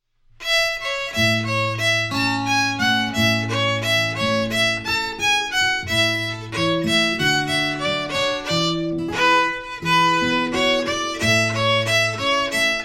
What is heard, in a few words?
Lamplighters Hornpipe slow